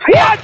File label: "heeyuh"; ooh ahh; heh huh